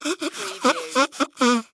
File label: Nose Blow Tissue